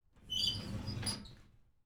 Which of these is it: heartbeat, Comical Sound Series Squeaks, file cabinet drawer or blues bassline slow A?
file cabinet drawer